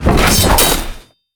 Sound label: Ruins Spikes Up